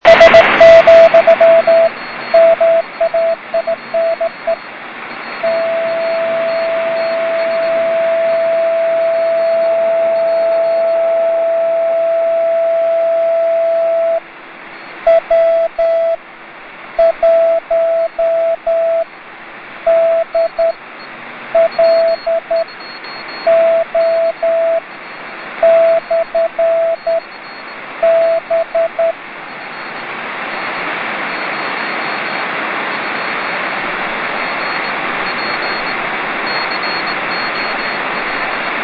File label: beacon; w1dlo